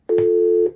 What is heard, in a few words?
Bogen Page Tone